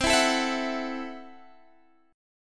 A Minor 6th C

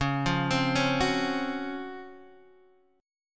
C sharp Major 11th C sharp, Guitar Standard; arpeggiated; chord; x,4,3,5,2,2

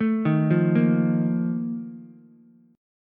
A sharp Major 7th Suspended 4th, Ukulele; arpeggiated

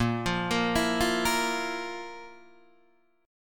F Major 7th Suspended 4th Bb

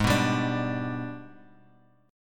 Minor Major 7th Flat 5th, Guitar, Open G; Gsharp; 6,4,5,7,x,x

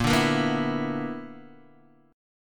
B-Minor Major 7th Double Flat 5th

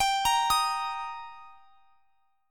G Minor Sharp 5th G, chord; Gm#5 arpeggiated; x,x,x,24,23,23

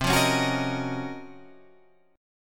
C sharp Major 13th, chord; C#M13/C strummed; JGuitar

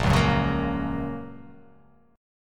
A sharp Minor Sharp 5th, down

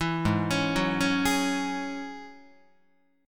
Ab 7th Suspended 4th Sharp 5th Ab down Guitar; 7,4,4,4,5,7; arpeggiated

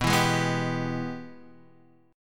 Ab Minor Major 7th Sharp 5th

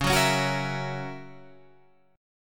F sharp 7th, guitar

